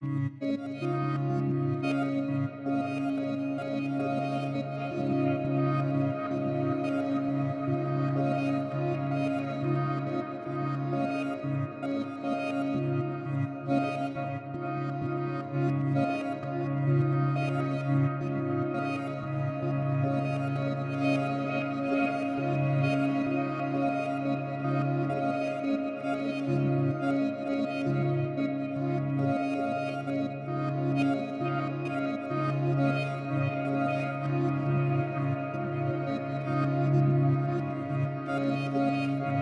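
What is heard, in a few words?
Granular guitar C#; Rialasten 2022 Xmas Sample Pack; 2022 Xmas Packs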